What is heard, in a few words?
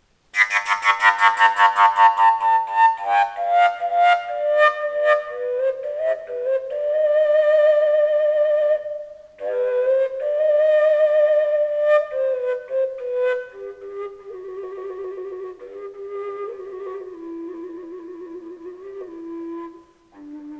prototype natural g, fujara; folkart slovakia